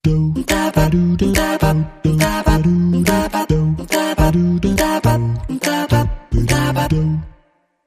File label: Stinger